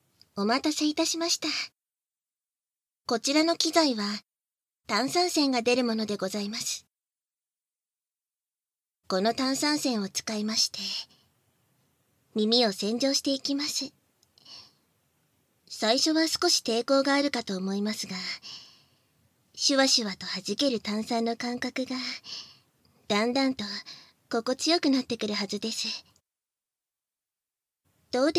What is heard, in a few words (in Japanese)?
耳洗浄; 簡易モノラル編集 効果音あり